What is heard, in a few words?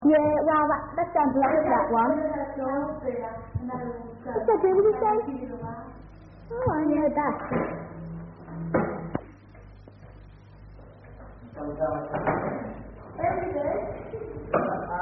Three Six Nine (Singers, Children)